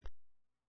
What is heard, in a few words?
silence